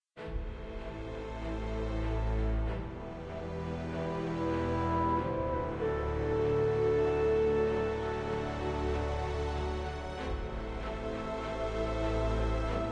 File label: player turn nature; devils demons android game music